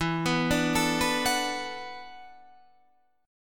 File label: E 9th Suspended 4th E down Guitar Standard; E9sus4 arpeggiated; capo=3